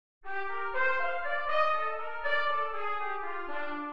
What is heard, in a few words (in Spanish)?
Min7 Inversion 0 en C